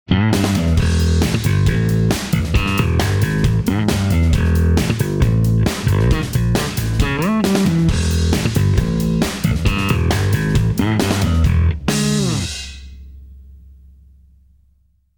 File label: Dean Markley Blue Steel Nickel Plated Bass Strings 45 105